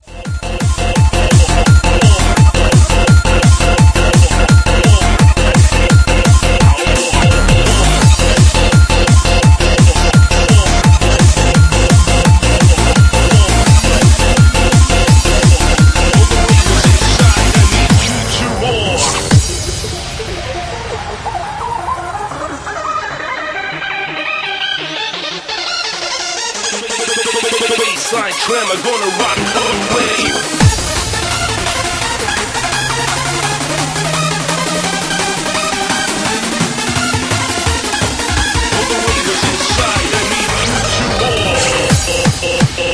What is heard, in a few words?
Bassline tremor